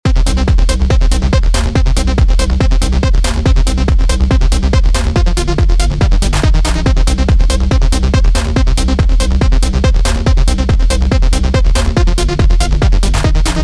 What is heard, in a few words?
Bass Liner and Dice Lead Sawer; neo retro 8 bar loops and midi files